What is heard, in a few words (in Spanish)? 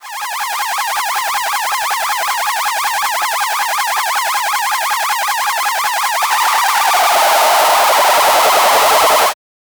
sonido de pistola para ju, retro game